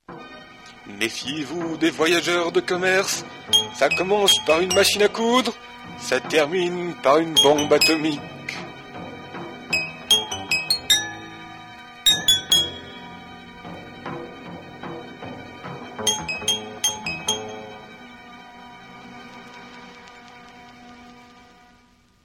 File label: interlude